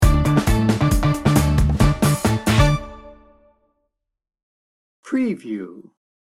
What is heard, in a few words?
tropical celebration sting bumper